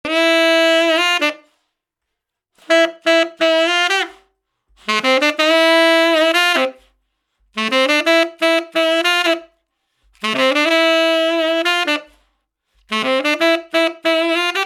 90bpm 9th Melody 7 Bar

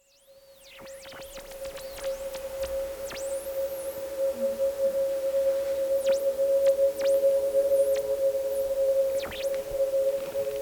Feedback Interferences

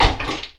Metal Pusher

